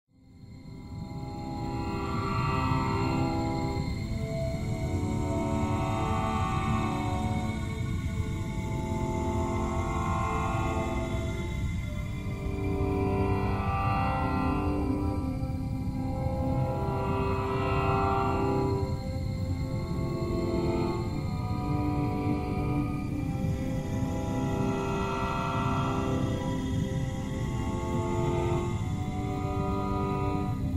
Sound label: Ambience; LBPVITA; LittleBigPlanet; PS Vita; PS3; PSP